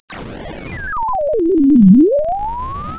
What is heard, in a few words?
pop